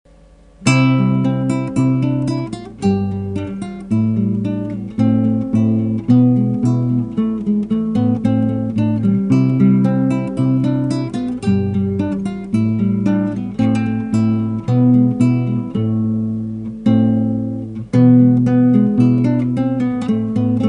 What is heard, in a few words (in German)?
hymne ukraine; Europa